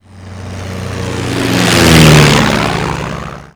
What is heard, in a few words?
henschel pass; WAR